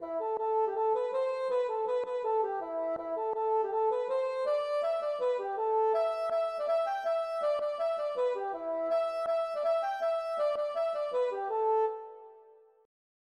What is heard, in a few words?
polka